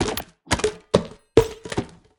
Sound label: defeat horn fall; smite; heimdallr